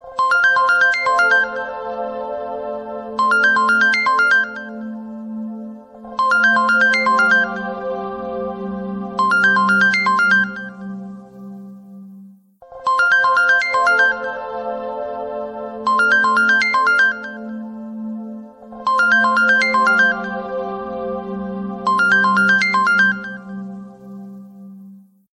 samsung galaxy s22 plus, dzwonki; telefon komórkowy

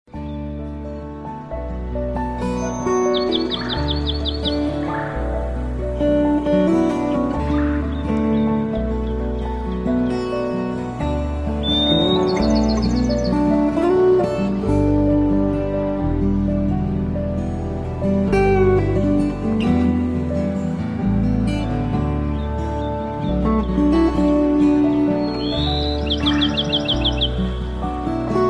relaksujacy dzwiek ebird

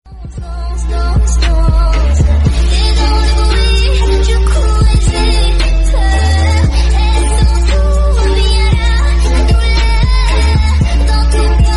Elektroniczne

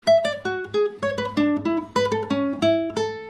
gitara akustyczna nokia

